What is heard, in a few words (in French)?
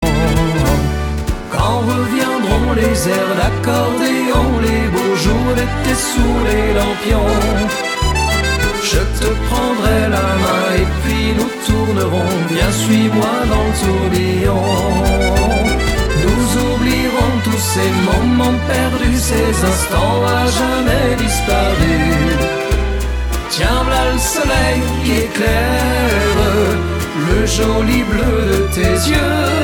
accordeons; jr cafe